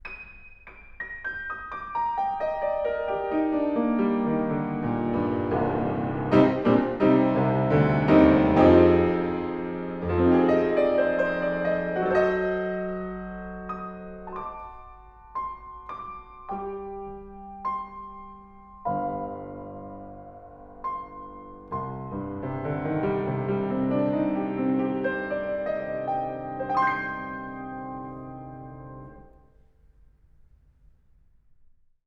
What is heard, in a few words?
Piano, Horiz Off Mic